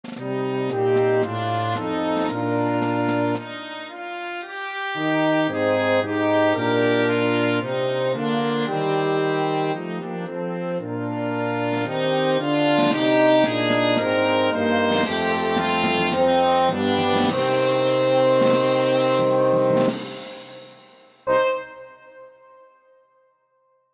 Trumpet Sample Track; God Rest Ye

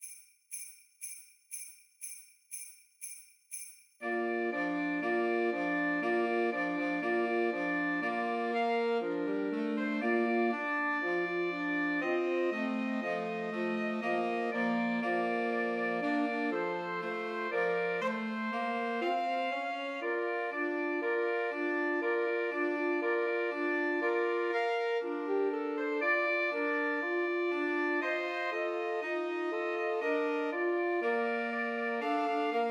Two Altos and Tenor Saxophone; Jingle Bells

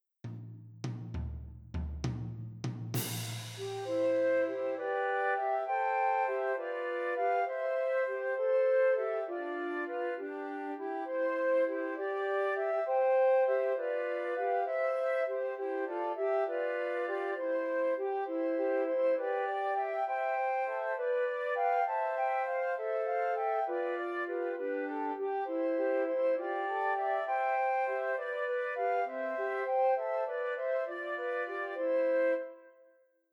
Trios for Three Descant Recorders

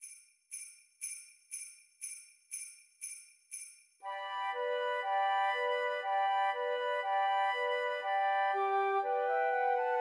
Christmas Trios for Three Flutes; Jingle Bells

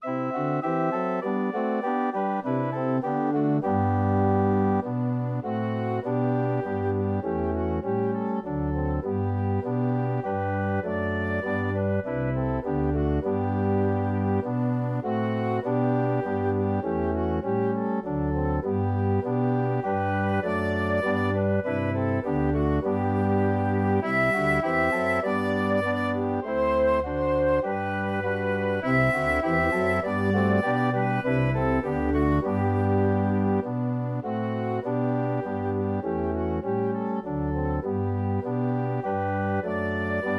Flute Track with Melody

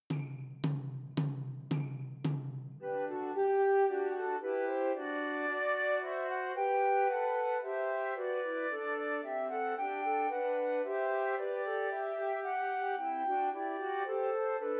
Trios for Three Descant Recorders; Christmas Trios

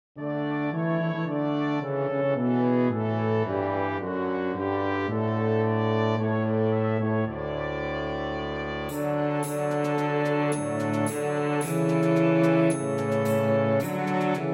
Cello track with Melody; O Come All Ye Faithful

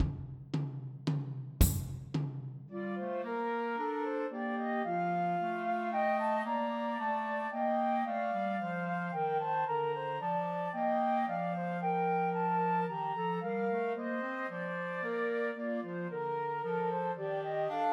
Trios for Two Flutes and Clarinet; The First Nowell; Christmas

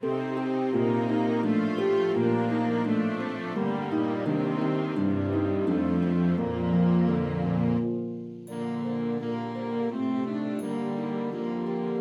Saxophone Track with Melody; Away in a Manger

